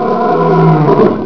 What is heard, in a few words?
ROAR